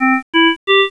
card med, alarms

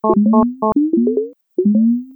Oxygenation, alarms; J Edworthy 2019

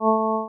Low, alarms; J Edworthy 2019

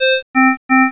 powermed, alarms

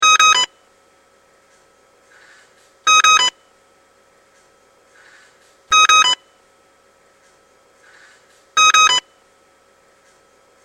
Drager High, alarms medical

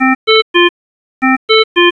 venthi, alarms

